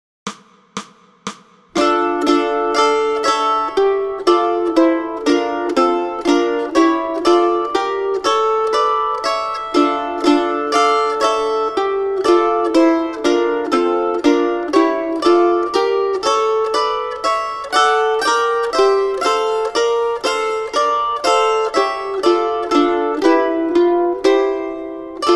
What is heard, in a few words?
God Rest Ye Merry Gentlemen Mandolin 1 2 3; christmas